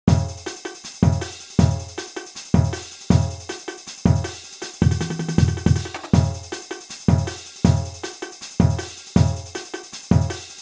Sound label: stadium groove, brass